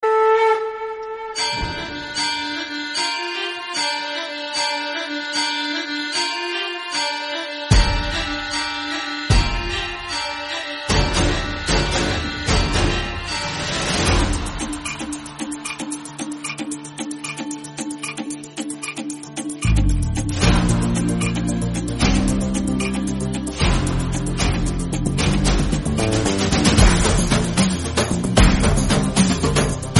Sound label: nagada sang dhol